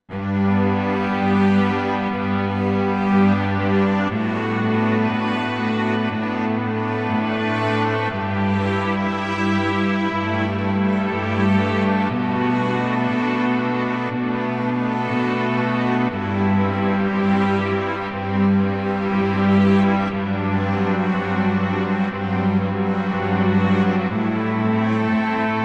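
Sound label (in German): Arco String konvertiert fuer den Yamaha MODX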